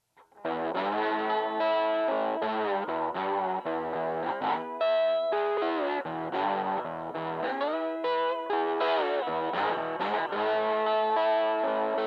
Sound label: hodad fingers slide gain